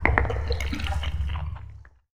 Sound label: drip